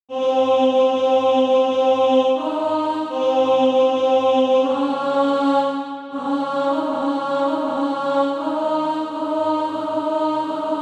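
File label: alto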